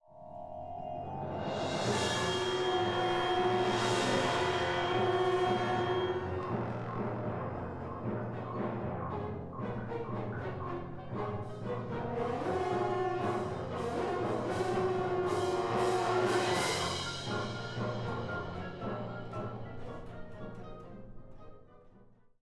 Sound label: orch